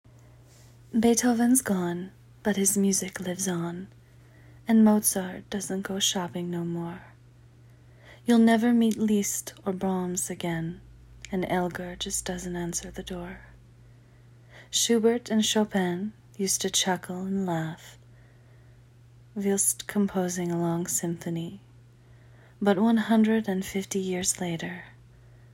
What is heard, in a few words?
Moans